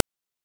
PITCHED NOISE; NES DPCM; MIDINES

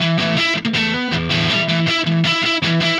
Happy Pub Guitar; Sonic Foundry (Sony Creative Software); Indie Rock Anthems; Session 05 - Happy Pub

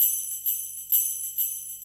drum 130 hihat, Techno Trance, Drumloops

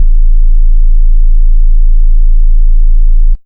kick, Big Beat Samples; Big Beat Samples & More; AKAI

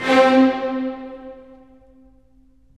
STR, Full Short; Roland - LA Composer vol. 4

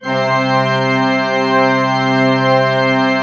C3, Cathedral Organ; Propeller Island

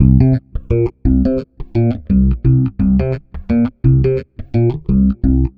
Filtered Funky Bass, Electric Bass

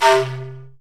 SIKU C2, The Andes

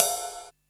Ride Cymbal, Drums, Roland MT32

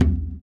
surdo; ILIO; Ethno Techno

